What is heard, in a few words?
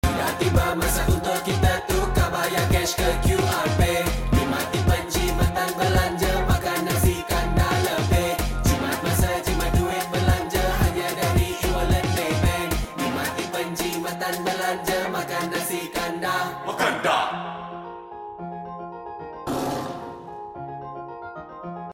chorus